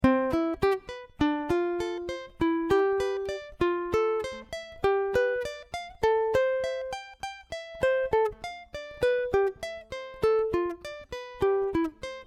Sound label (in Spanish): C Escala Back